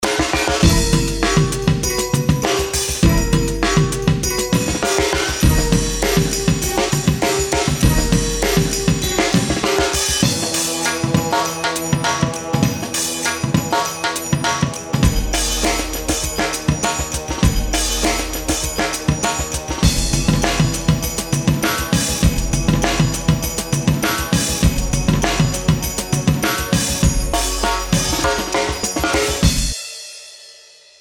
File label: breakbeats